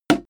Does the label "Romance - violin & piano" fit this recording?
No